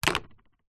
household remote control tv plastic set down wood table; zapsplat